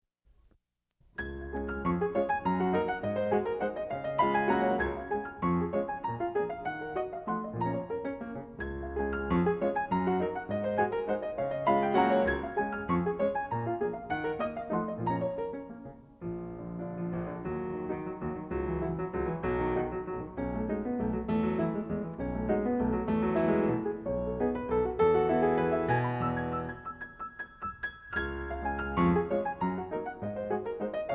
waltz; Brahms

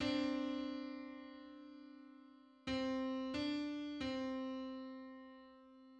Five-hundred-seventy-fifth harmonic on C

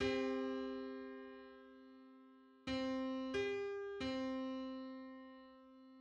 Seven hundred eighty third harmonic on C

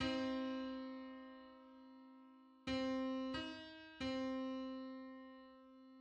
Hundred-fifty-fifth harmonic on C

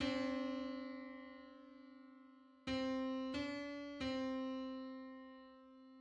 Hundred-seventeenth subharmonic on C

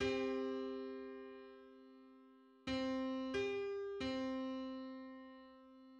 Hundred-ninety-fifth harmonic on C

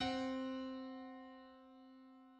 Augmented eleventh on C

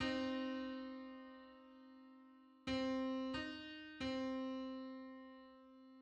Three-hundred-first harmonic on C

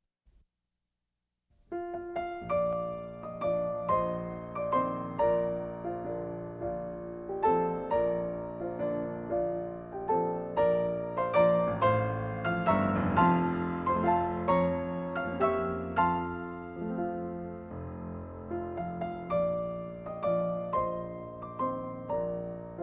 waltz; Brahms

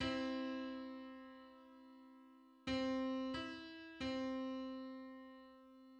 Six-hundred-thirty-seventh harmonic on C

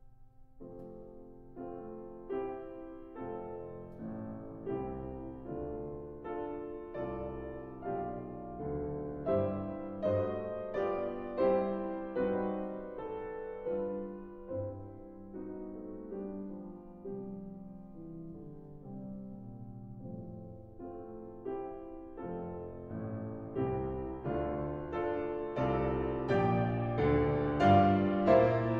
Piano Concerto, Maestoso; Brahms; Piano Concerto No. 1

